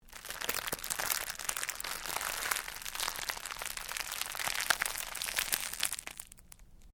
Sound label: Cellophane crinkle